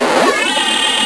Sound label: CHARGE